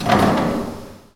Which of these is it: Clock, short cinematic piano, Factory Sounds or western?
Factory Sounds